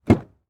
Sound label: Transportation Jeep Commander Open Door Exterior